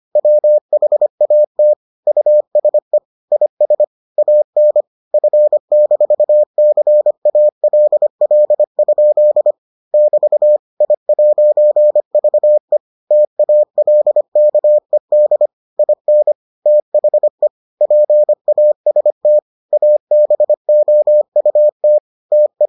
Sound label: morse